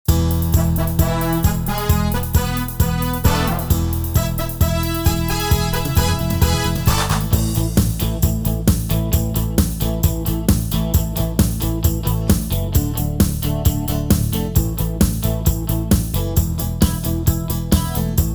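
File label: A Live track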